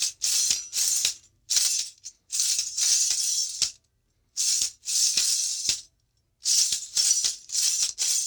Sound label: Tamborine Jingles, Arabic; Sonic Foundry (Sony Creative Software); Continental Drift Aftershocks